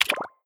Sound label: Common Button; onlinecasino